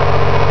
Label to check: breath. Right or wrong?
Wrong